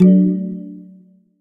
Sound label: spin Button Click; berry burst